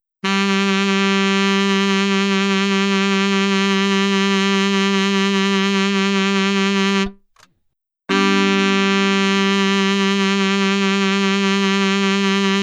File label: tenor g vib, sax